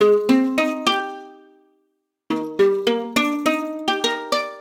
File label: Plastic Guitar Melody G Phrygian 104 bpm; MIDI Clips; Tonal; Ableton; Factory Packs; Lost and Found